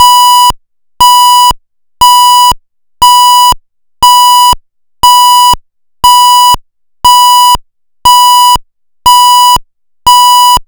Interrupted PFEER UK, PFEER (general alarm) UK BS5839-1 (back-up alarm); Interrupted tone; pfannenberg; signaling-technology; combined-signaling